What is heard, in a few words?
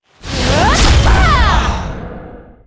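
attack, monster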